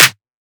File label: clap oneshot; GhostHack